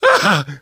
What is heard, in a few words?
hurt critical, survivor voice; source engine; left 4 dead 2; namvet